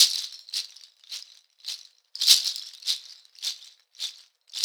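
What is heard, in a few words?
shaker, Synclavier Percussion, World & Orchestral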